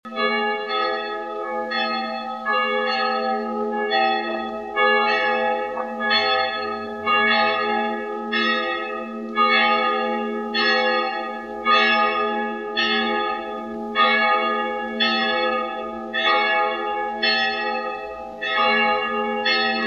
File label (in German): Glocken; Bialla; ostpreussen; Kreis Johannisburg